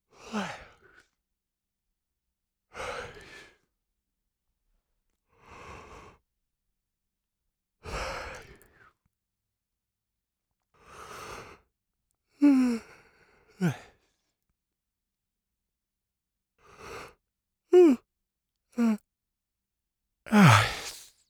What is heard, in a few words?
male yawns